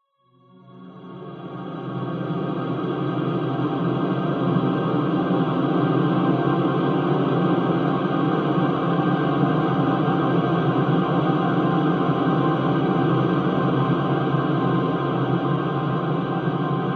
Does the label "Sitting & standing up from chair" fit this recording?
No